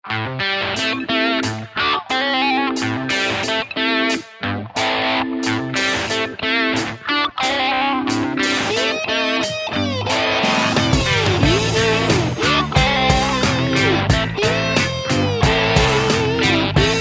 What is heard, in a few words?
guitar